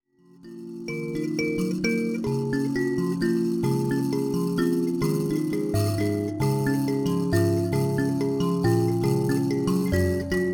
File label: mbira